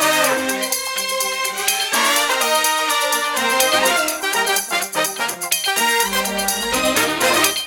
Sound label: music loop top; disco danny